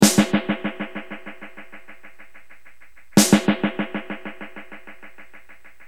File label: DM100